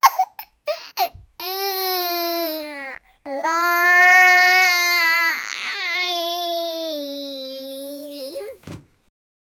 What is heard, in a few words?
happy child